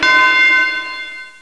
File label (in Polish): przeskok; train